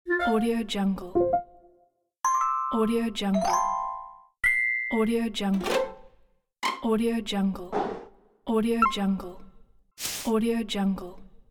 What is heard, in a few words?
alerts